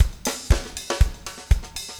4 on floor 120, loops, 120bpm

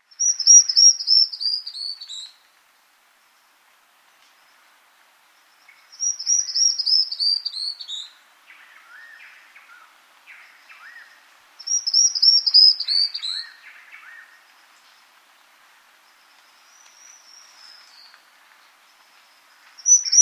Squamate Antbird, S. America

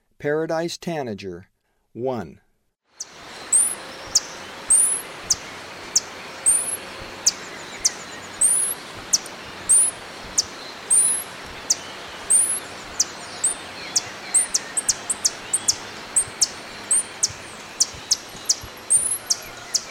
Paradise Tanager; S. America; Eastern Lowlands

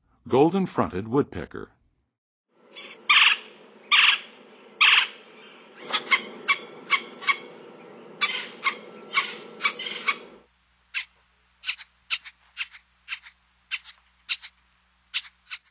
Golden-fronted Woodpecker, Songs of Birds; North America; Western USA; Western USA Songbirds

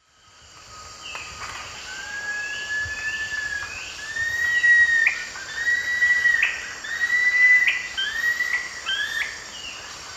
Nasica longirostris, song; S. America; Eastern Lowlands

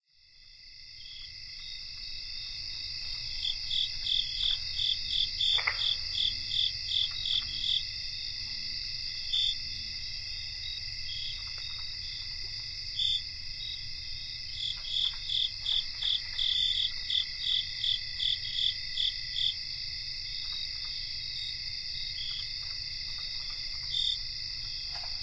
Cricket chorus, Songs of Insects; N. America

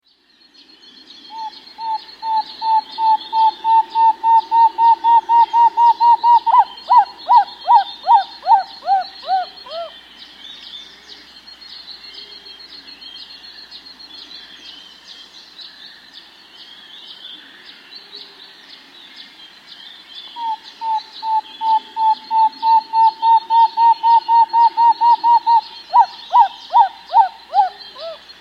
Short-Tailed Antthrush; S. America

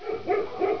DOG, animal

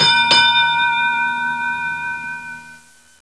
SHIP BELL

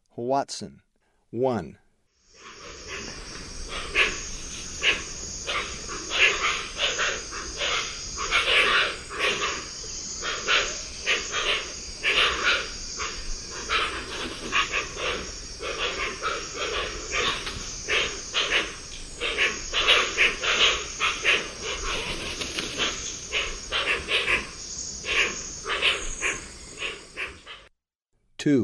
Hoatzin; S. America; Eastern Lowlands

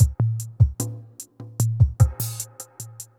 evil beats 75

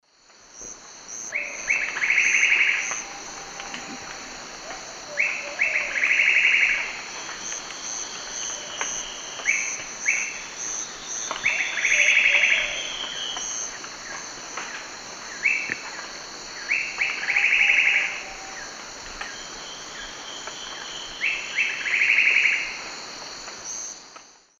Automolus melanopezus, song; S. America; Eastern Lowlands

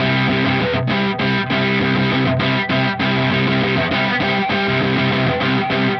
Riffing In Five, Rock, Guitars

